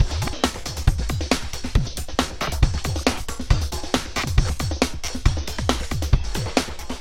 drum

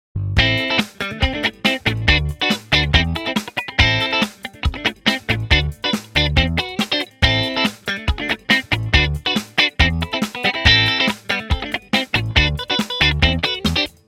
amp sim test clean; logic

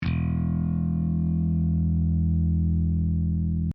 BassE1

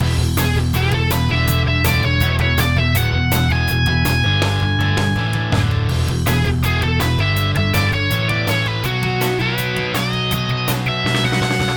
band dry

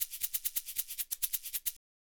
bip 2 bip

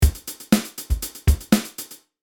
16 beat

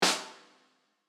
Sn bottom eq

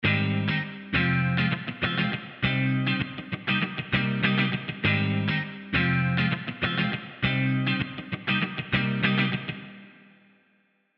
Guitar clean

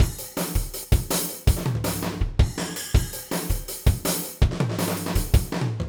drum mix dry

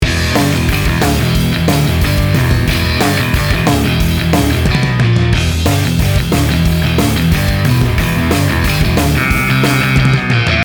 adaptive limiter

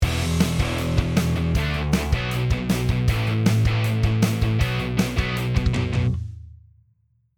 Dst 808; TSE

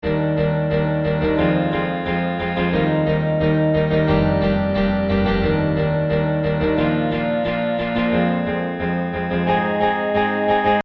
Rhythm

